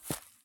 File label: sprint grass low; Escape from Tarkov